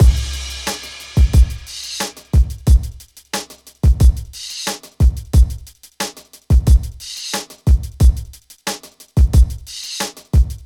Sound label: Drums; Hip Hop Philosophy; big fish audio; Apple Loops; 090 Emin